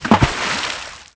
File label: drop man; Nintendo; July 2020 Gigaleak